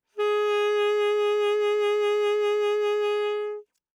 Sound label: Alto Sax vib ff Ab4, Eb Alto Saxophone; Woodwinds; MIS Pitches - 2014